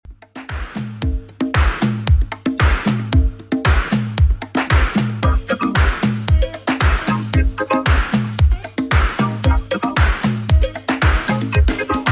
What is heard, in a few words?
80s Redrum, 114 bpm